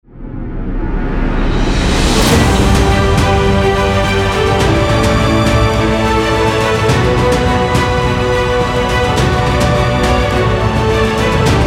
uplifting epical logo

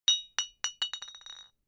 object bottle potion small drop

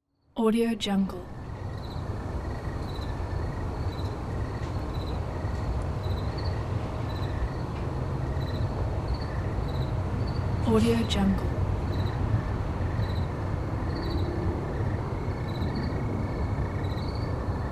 night city ambience

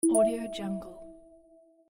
message; j sis

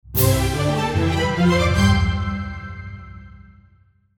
victory

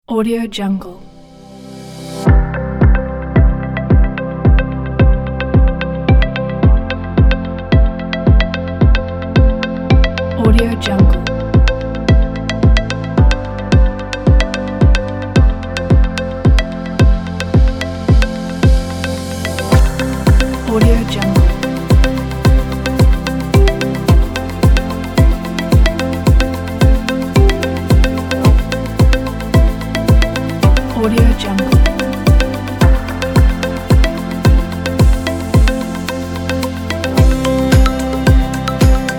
ambient corporate motivation; jgork

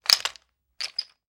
puppet rattle miscellaneous wooden; prosoundfx

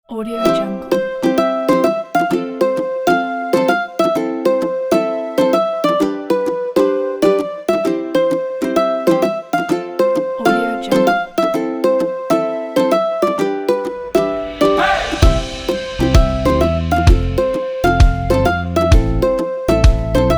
kids upbeat; starmusicproduction

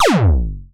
arcade laser sound; bigmanjoe

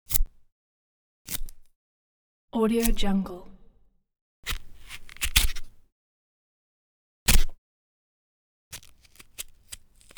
knife slash; collanderson

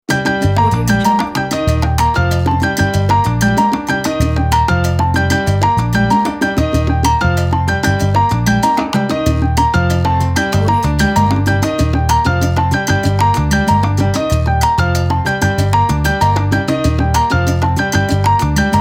salsa timba

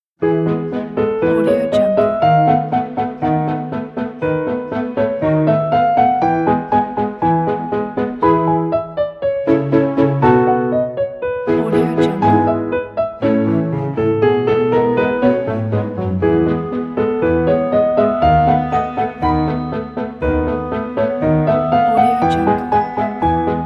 the classical music

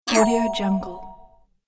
goblin hurt by magic

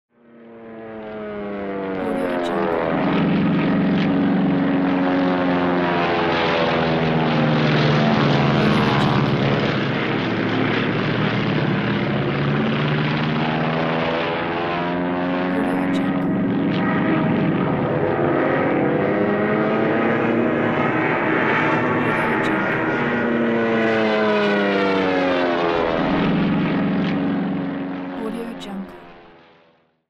ww2 air fighters passing ambience

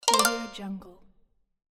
toy harp strum

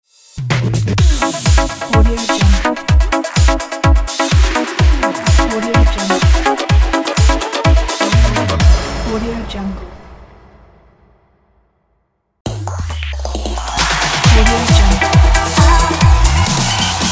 motivation ident pack

